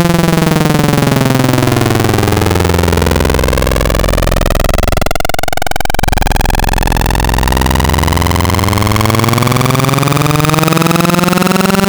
Saw Formants, wavetables; Cymatics; Wavetables Collection Vol 3; Serum

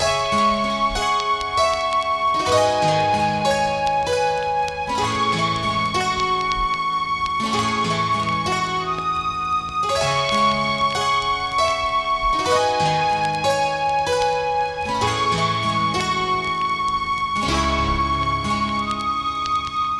Full no vox, bpm 96; hiphop; StudioLinkedVST Vinyl Touch; Progression 01

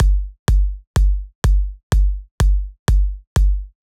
Big EDM Kick Loop, 128 BPM; Future House Tools

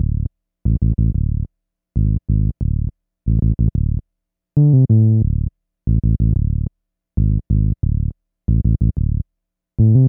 Bass, Hip Hop, 092, Dmin

